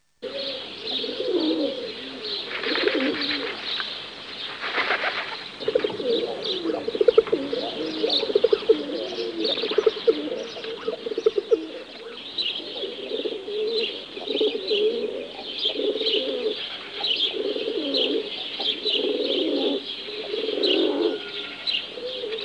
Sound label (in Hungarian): Parlagi galamb, Madarak